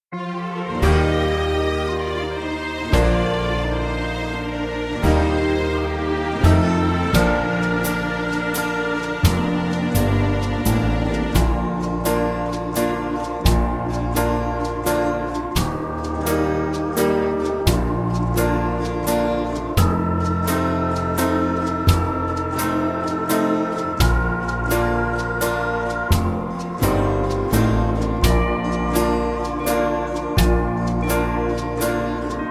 (Bb) No Backing Vocals

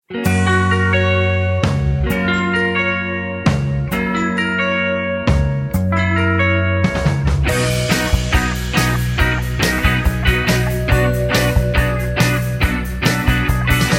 (Gb) No Backing Vocals

